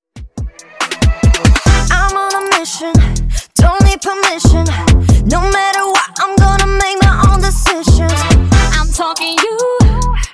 Funk Intro Outro 70Bpm

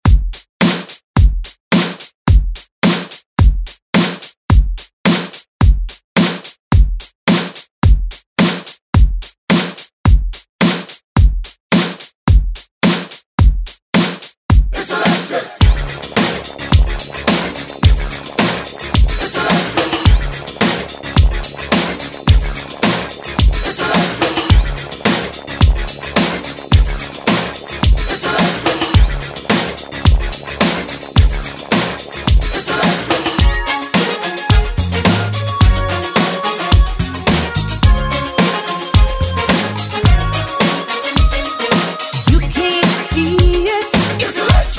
Remix 108bpm

